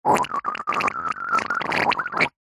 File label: human fart wet dribbly poop; zapsplat